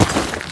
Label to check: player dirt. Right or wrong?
Right